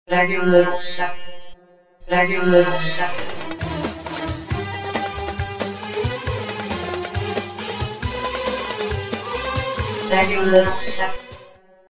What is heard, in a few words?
Jingle; ondarossaraba